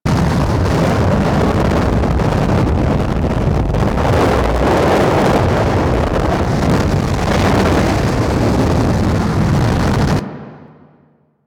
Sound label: Tank Spray Pass By Loop; Effervon; Weapons; Warframe; Lasrian